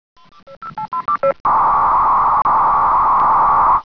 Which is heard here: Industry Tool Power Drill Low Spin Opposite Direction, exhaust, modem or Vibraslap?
modem